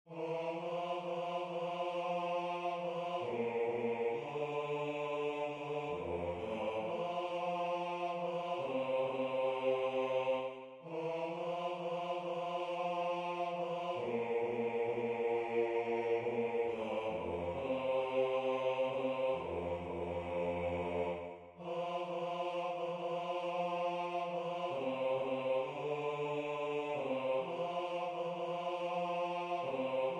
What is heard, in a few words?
Bass